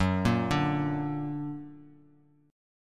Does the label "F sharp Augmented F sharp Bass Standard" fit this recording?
Yes